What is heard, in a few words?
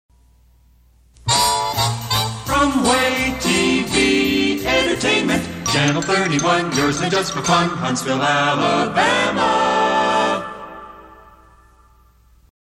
jingle; just for fun